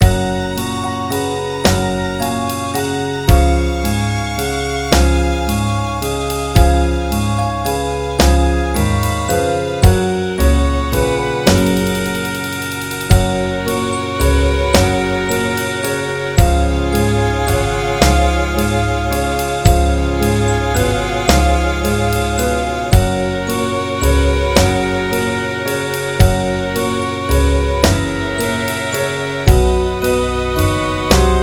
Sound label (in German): Süßer die Glocken Playback